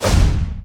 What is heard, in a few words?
wand down stab dash